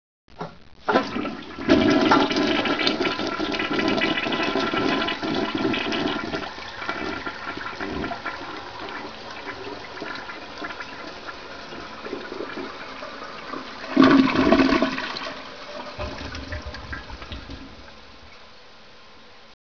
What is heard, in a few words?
flush